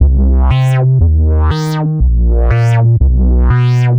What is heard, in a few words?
Tech Bass C0, techno 120bpm; musicradar; Bassline